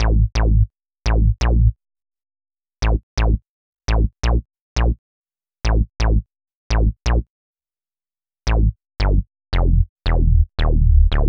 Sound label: Synth Bass; Basses